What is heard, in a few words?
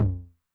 HI TOM, Low Tune Med Decay, 909; classic machines; HT - HI TOM